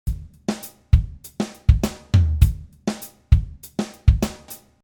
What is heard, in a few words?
Pultec Drumloop; UAD